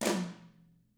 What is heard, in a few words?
TAMA TOMR, Drums; ILIO; Double Platinum Drums 1